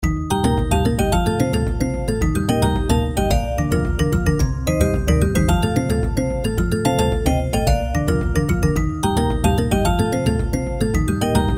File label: trex outro bgm; The King Of Dinosaurs